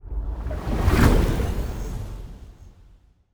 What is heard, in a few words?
construction ship move; aquatics